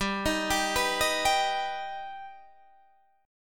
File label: G Augmented G Guitar Standard; G+ arpeggiated; 15,18,17,16,16,15; JGuitar